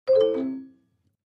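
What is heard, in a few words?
Clavichord Short Descending; keyboards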